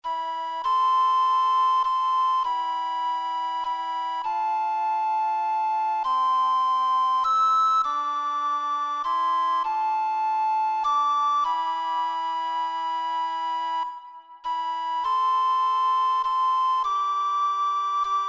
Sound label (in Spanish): flautas soprano; El Cid; 1 VOZ